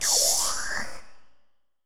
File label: minimare die